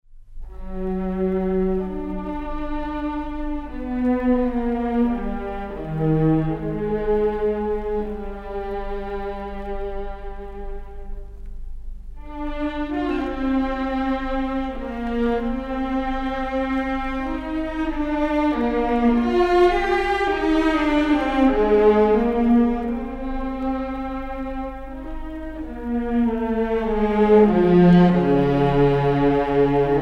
Préludes pour orchestre à cordes; Moderato; BARRAUD

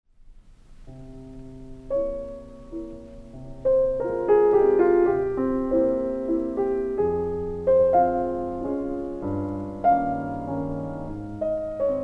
MAZURKA EN FA MINEUR; CHOPIN; OP. 63 N° 3

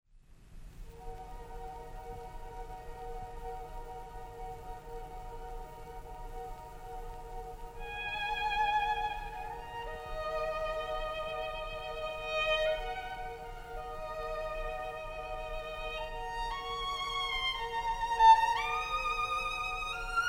Concerto pour violon et orchestre en ré mineur Allegro moderato; SIBELIUS; op. 47

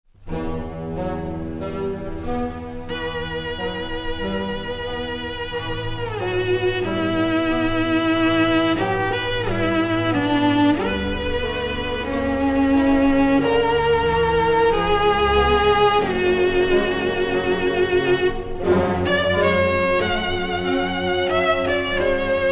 Concerto pour violoncelle et orchestre en mi mineur Andante Poco meno mos; Poco meno mosso Andante; PROKOFIEV; op. 58

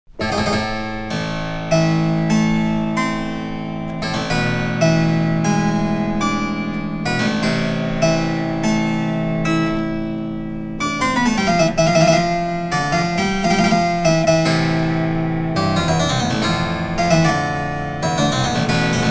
Pièces de clavecin Prélude; RAMEAU; Premier Livre

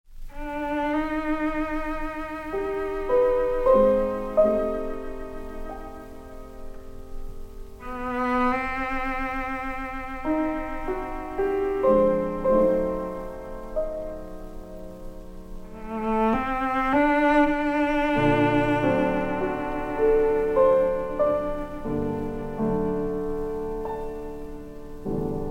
Sonate pour violoncelle et piano en sol mineur Lento; RACHMANINOV; op. 19

